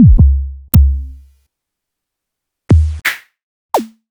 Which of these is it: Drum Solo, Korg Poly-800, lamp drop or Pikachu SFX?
Korg Poly-800